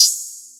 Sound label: OH; Polar